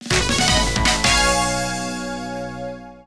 Jingle Race Start; StreetPass Mii Plaza; Slot Car Rivals

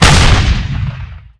Sea Taihou; Etrian Odyssey III HD

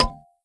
Candy Fall; candy crush jelly saga gamerip

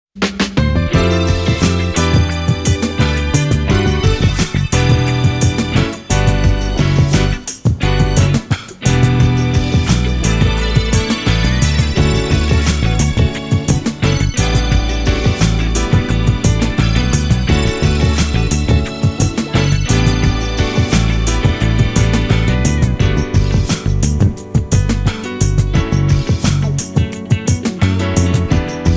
Karaoke; yukyu gensoukyoku 4colors

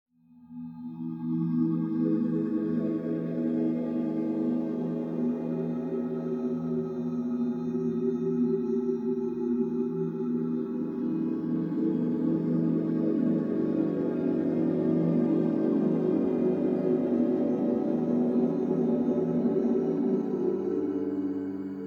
Ambient Loop; Ambient 2 Loop; Drox Operative

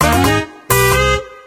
Battle Intro; Kairobotica

